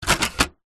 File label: office printer paper tray plastic pull out